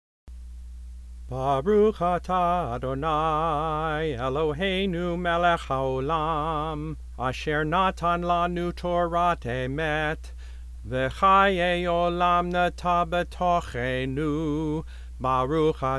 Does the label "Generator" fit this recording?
No